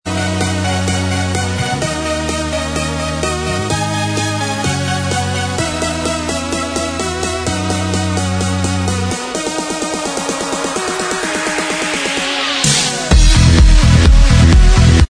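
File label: instrumental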